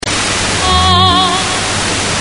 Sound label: short ping